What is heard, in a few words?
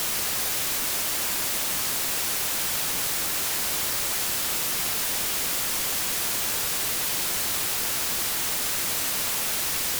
noise; IVAS rend ISM output level +6dB too high; IVAS BASOP